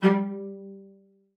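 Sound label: cello spiccato G3, cello; Xsample VOL-5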